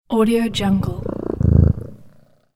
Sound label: cat purr